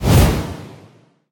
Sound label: whoosh large